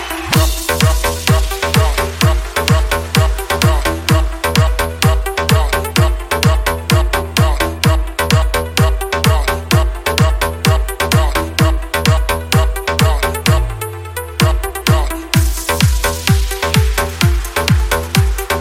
English Tik Tok Ringtone

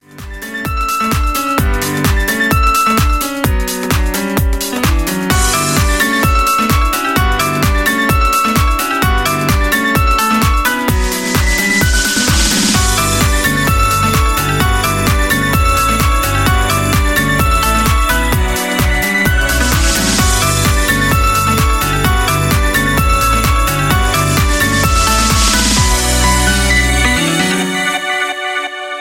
Remix Ringtone

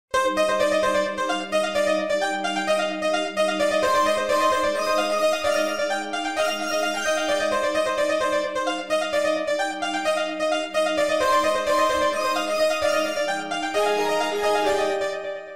Motorola Original Ringtone; Moonlit Haze

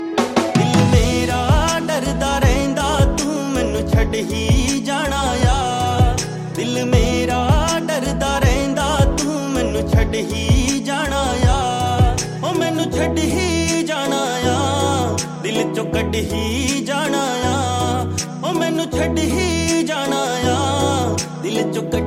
Sad Punjabi Song Ringtone

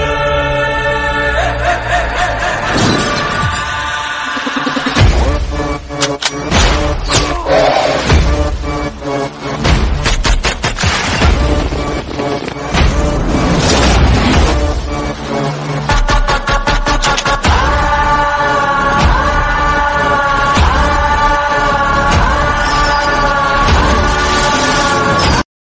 Bollywood Theme Ringtone

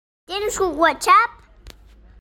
Whatsapp Voice Message Sound

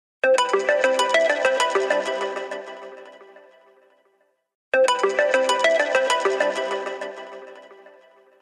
iPhone 12 Pro Apex Original Ringtone

